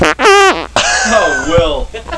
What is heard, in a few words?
fart